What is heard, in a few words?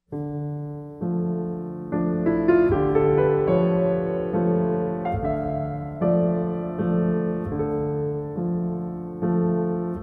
Piano Cello